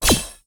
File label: cashstack symbol appear; pork knox